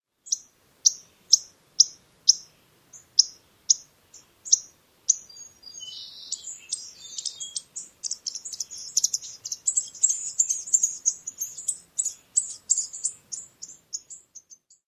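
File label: chips and other calls, bird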